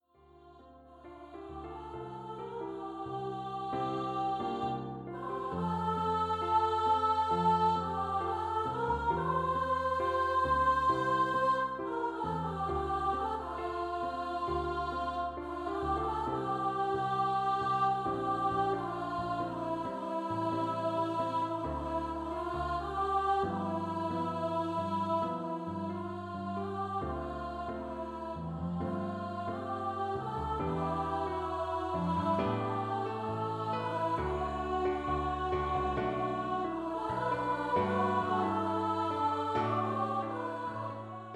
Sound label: 2 part song for Ladies Choir